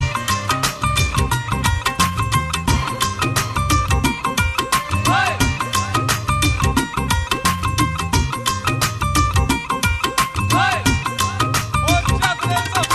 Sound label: punjabi ringtone